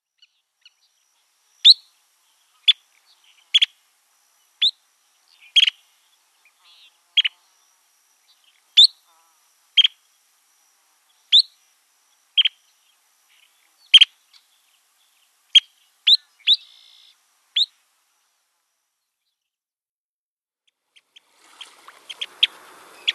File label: Wilsons Plover